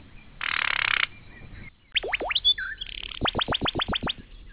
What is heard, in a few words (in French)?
Chant de troglodyte Bande passante 0-20 kHz, Echantillonnage à 40 kHz